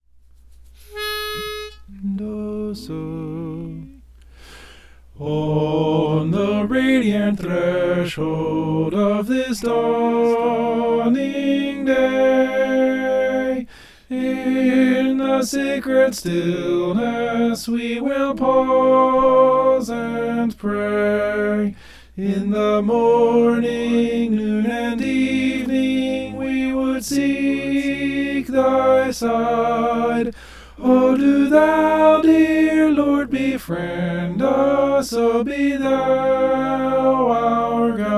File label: soprano